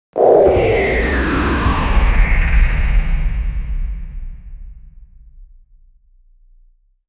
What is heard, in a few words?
object landing movie sound